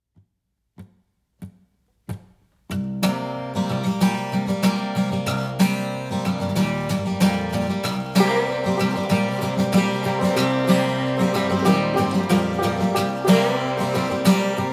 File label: Radiophonic